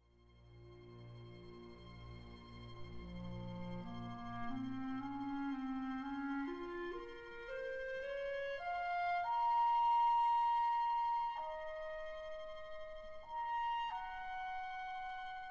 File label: clarinet